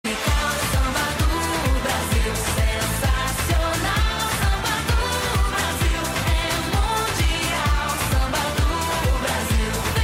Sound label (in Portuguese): Samba Do Brasil